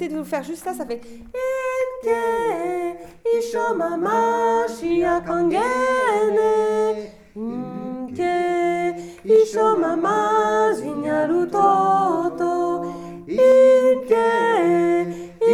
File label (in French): basse hommes